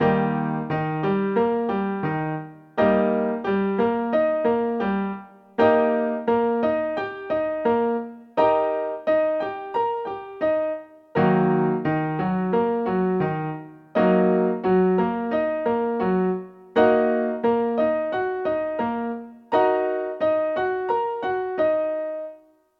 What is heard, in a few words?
triad and inversion next rhythm in E flat and E flat m